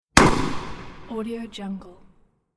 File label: petard